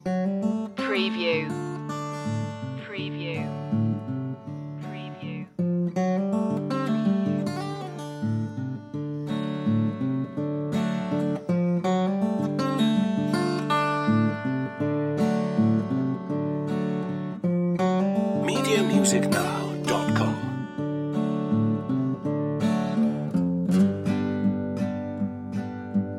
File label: soul broke blues loop